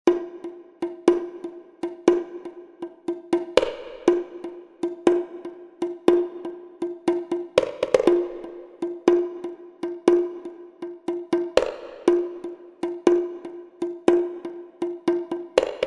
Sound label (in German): Percussion, Im folgenden Audiodemo habe ich Diffusion vom Maximalwert langsam zum Minimalwert heruntergefahren; Eventide Tverb